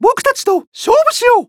Warcry

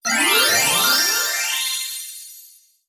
Advmap Unlock; Puyo Puyo Puzzle Pop; iOS, macOS, mobile

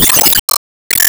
Enemy Rose Queen Active Attack Shot

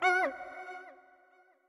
Toxic Mask Hurt

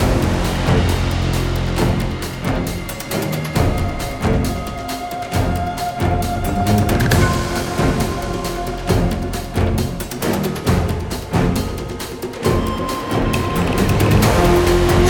Year-End Drumbeat; Bong! Year-End Drumbeat

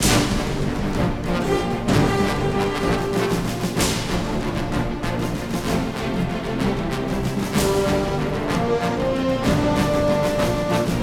Combat

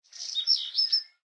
Ambience Menu Little Bird; Subway Surfers City; Android, iOS

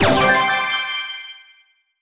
Start

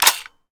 Sfx Jammed Weapone; Akxolotl

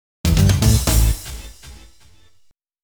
Starting Race; World Rally Fever Born on the Road; MS-DOS

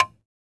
METRONOME

